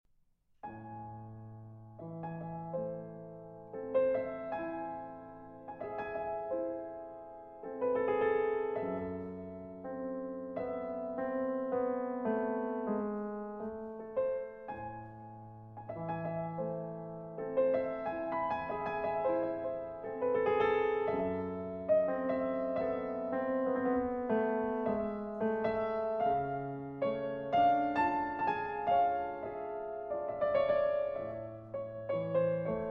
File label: Nocturne For Piano Solo; Benabdeljalil